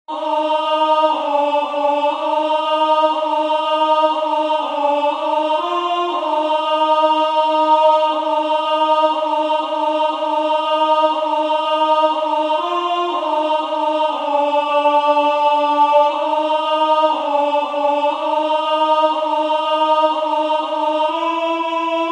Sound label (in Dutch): BLIJF MIJ NABIJ Alto